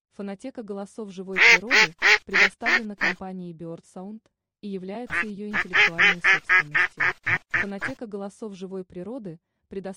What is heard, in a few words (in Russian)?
Кряква; GERMANO VITALI